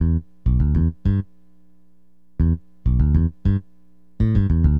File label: BASS, Acid Jazz Funk